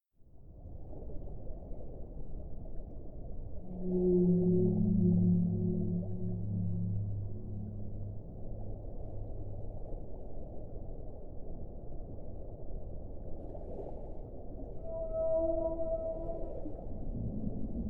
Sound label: whale calls